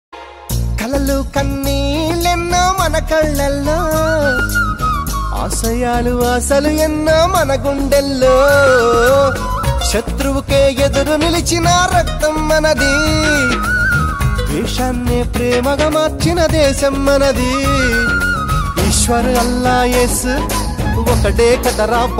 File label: song, telugu; independence day special; khadgam movie